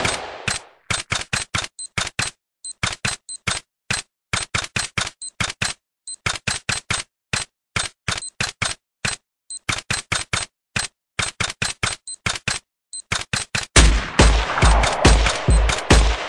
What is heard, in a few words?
Hand Gun Sound